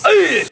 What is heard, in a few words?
attack, electro